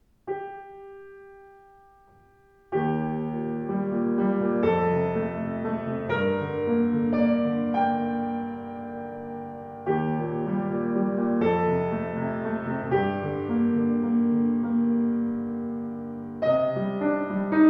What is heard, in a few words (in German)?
Schimmel Klang, Klavier; 112 schimmel nussbaum